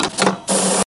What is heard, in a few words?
typewriter